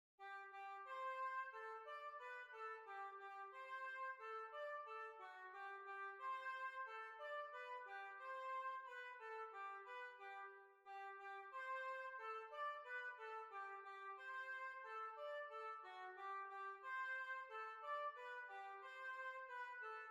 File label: Hornpipe; Staffordshire Hornpipe